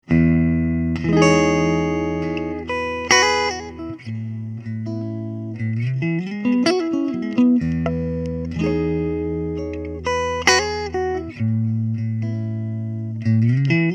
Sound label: el guit; el guitar sort; Maestro; Lenny SR Vaughn